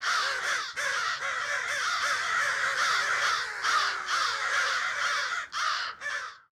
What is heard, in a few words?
atm crows; pineview drive